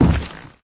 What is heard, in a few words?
foot step; Smite; Zhong Kui